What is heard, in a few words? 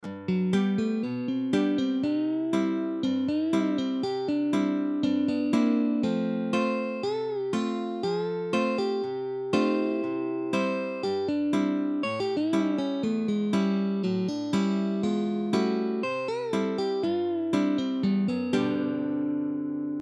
Country Licks Country Bending Solo, guitar; Arlen Roth